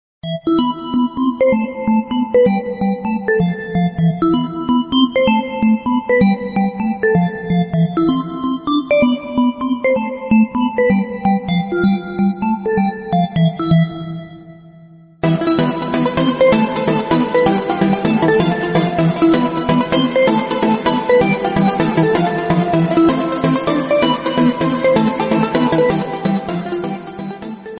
cinematic mobile phone ringtone; samsung

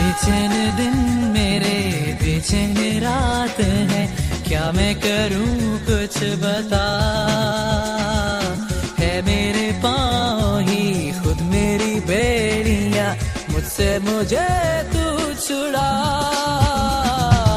sad ringtone, bollywood

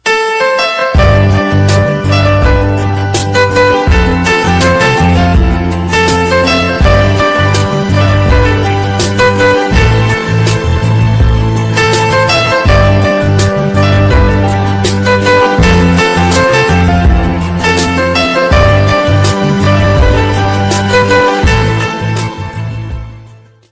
background instrumental ringtone, bollywood

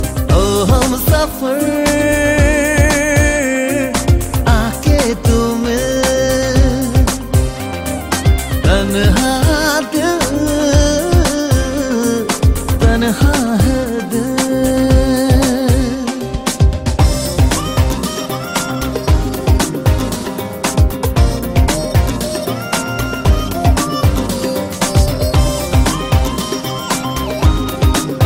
hindi ringtone, bollywood ringtones

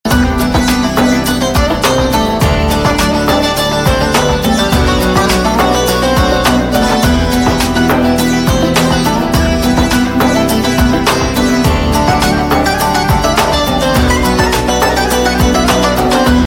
music ringtone

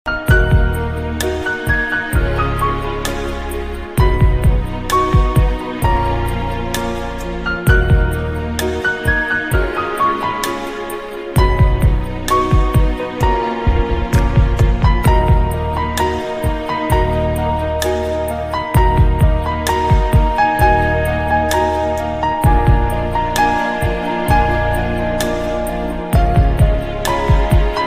from heart ringtone; nokia ringtones

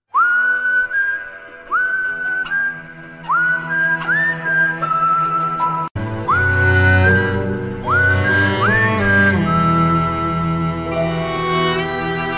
whistle ringtone; aatma

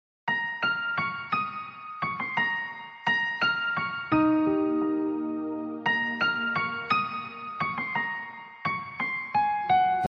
soft sms tone ringtone

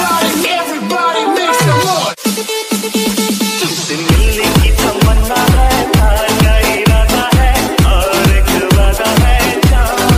sad ringtone; bollywood ringtones

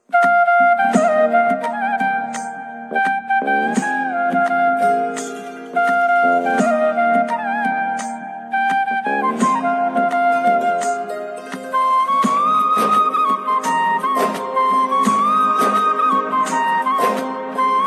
bollywood music ringtone

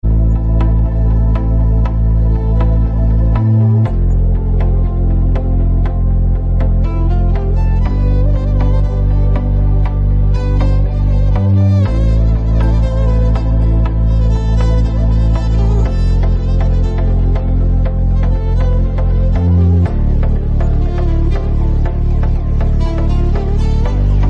arabic background violin music ringtone

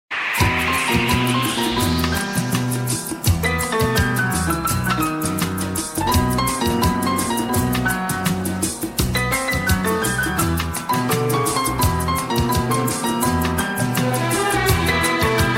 piano ringtone

